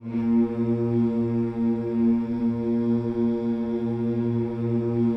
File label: HMMS A#2 B, gospel hmm; Spectrasonics; Vocal Planet Vol.1 Gospel; akai